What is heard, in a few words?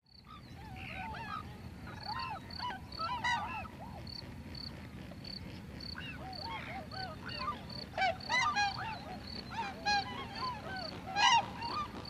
Wings Buzz Overflight; Lodi; Nature Sounds Recordings